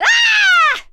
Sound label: Female Scream